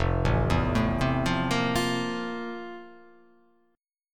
F Major 11th, chord; arpeggiated; FM11; 1,0,2,2,0,0,0,2; JGuitar

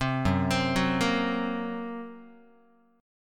F sharp Major Flat 5th F sharp Guitar; F#Mb5 arpeggiated

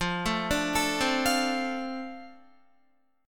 F 6th, Guitar; F6 arpeggiated; 12,12,14,14,12,13

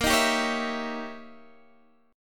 D sharp 7th Flat 9th, A sharp

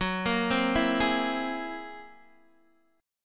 F sharp 9th Flat 5th, Guitar, Open D

